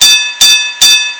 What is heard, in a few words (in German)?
Glocke